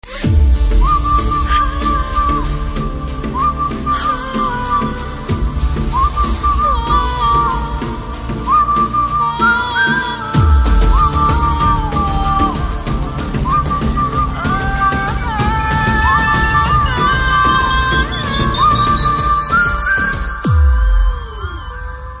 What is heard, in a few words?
Whistle Ringtone; Thimmarusu Movie; Telugu Ringtones